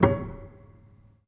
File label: Hamm Perc, Piano Hammer Perc; Piano Miscellaneous